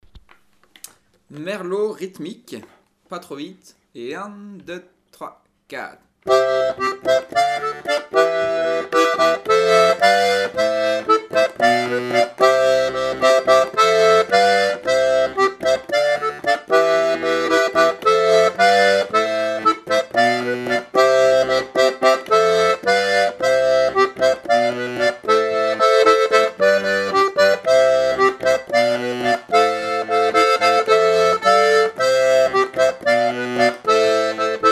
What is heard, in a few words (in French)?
rythmique